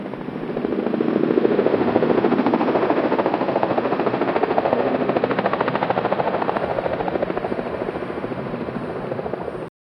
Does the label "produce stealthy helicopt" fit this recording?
Yes